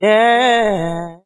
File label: "yeah", sung